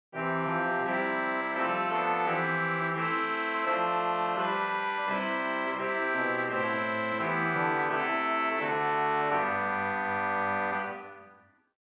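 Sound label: Swell Contra Fagotto 16, organ; St Annes Moseley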